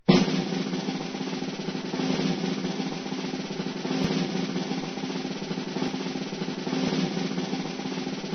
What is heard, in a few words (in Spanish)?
redobleeee